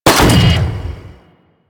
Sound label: Saw Blade Launcher Shot; Warframe